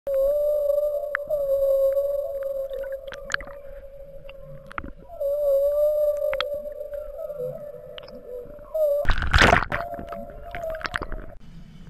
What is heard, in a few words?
Haunting song of humpback whales